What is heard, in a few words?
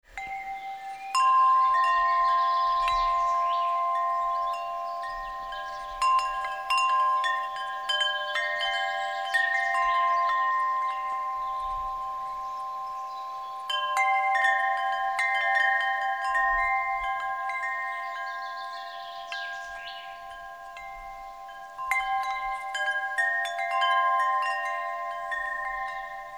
wind chimes